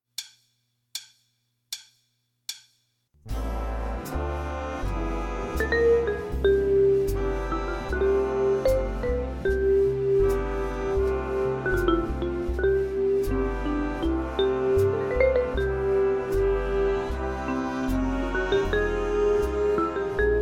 last set vibes, band

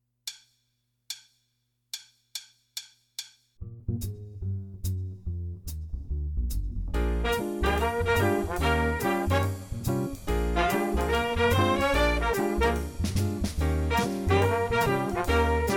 bass cut

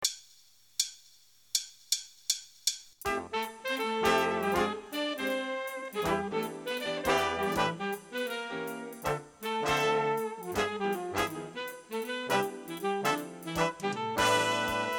guitar bass

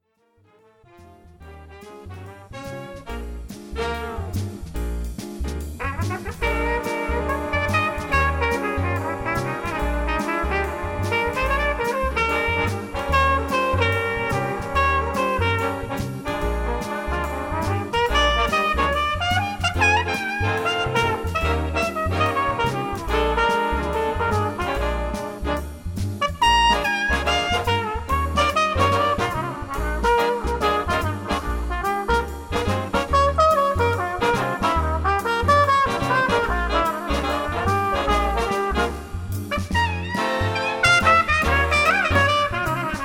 trumpet

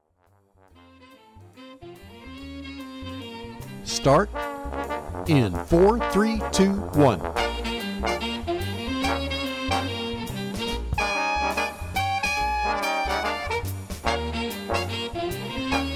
guitar

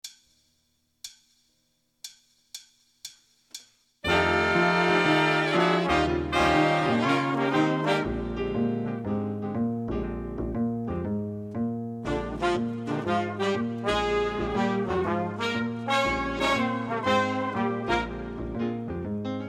drums

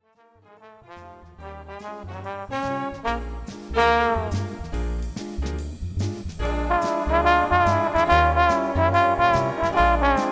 trombone

